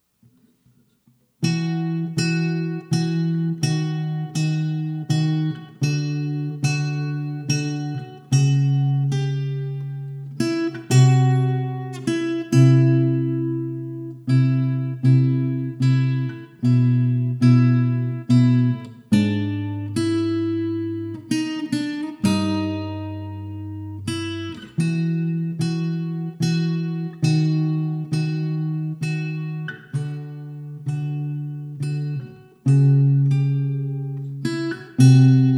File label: Waltz